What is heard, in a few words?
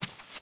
carpet barefoot, Footsteps